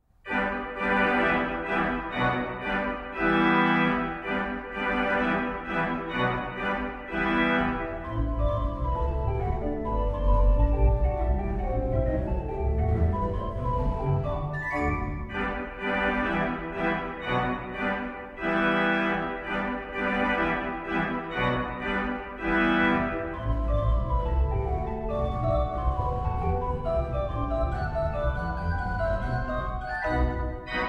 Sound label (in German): Marsch